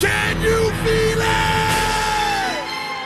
开场声; LockChime; 特效音; 非诚勿扰

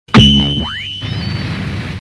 高达启动; LockChime; 影视经典; 智能汽车

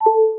iPhone充电提示音; LockChime; 电脑

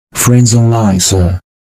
朋友上线; LockChime; 电脑

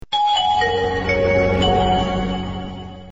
诺基亚; LockChime

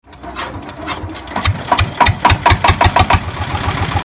拖拉机启动; LockChime

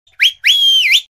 口哨声; LockChime; 特效音

